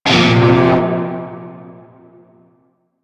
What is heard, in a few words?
Shawzin Blues Chord C; Music; Warframe; Narmer Shawzin